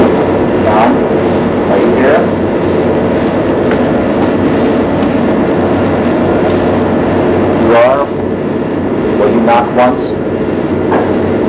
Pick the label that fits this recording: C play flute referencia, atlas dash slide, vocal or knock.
knock